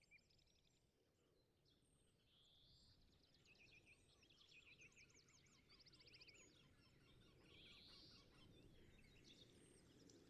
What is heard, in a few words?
soundscapes